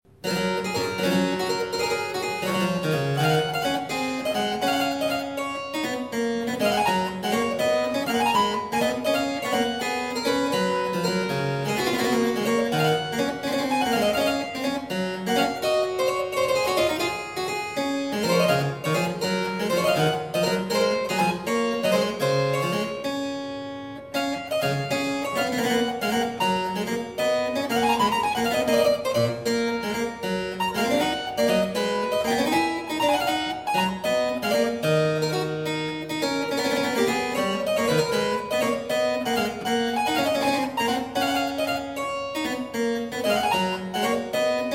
Goldberg Variations, BWV 988 Variatio 7 a 1 ovvero 2 Clav. Al tempo d; J.S.Bach